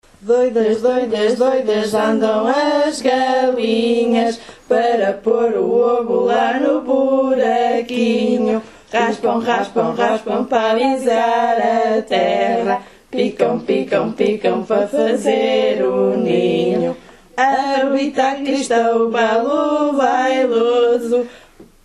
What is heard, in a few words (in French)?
Cocolococo chanson portugaise